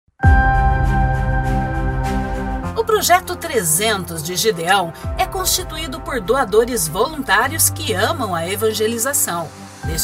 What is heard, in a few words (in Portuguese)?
VINHETA; PROJETO GIDEAO